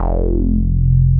DIGALO F2, DIGALOGBS

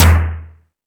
More Toms, Drum Machines

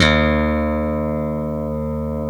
E 2 FUNK POP, GUITARS, FUNK STRAT; Spectrasonics; Hans Zimmer Guitars VOL-1

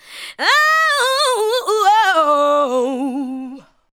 ADLIBS

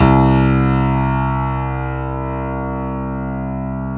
PNO Grand Pno; Club-50 - Foundations Roland

